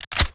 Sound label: mp5k clip in